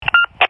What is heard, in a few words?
Kenwood PTT Release, radio sounds